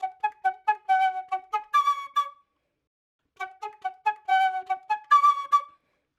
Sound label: flute loop 141 BPM F# Min, dry; Melodics; Instrument Loops; Flutes; Cymatics; Cobra Hip Hop Sample Pack